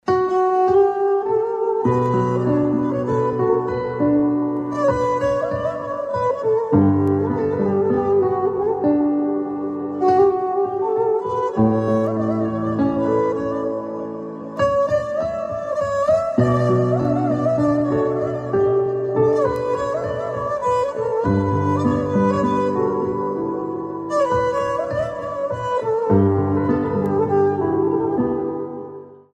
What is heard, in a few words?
Ringtone Arabic; اهنگ زنگ خارجی